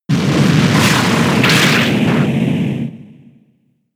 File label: Air Slash; Pokemon; gen 6; XY; ORAS